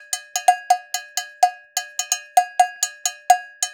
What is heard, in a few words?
Dance Hi Bell; Dance DJ; Percussion; BellCowbellAgogo; Pro Tools Essential Loops; Digidesign